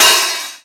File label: hot open high hat sound f key